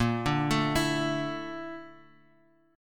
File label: A sharp Major Sharp 11th, Bass Standard; arpeggiated; JGuitar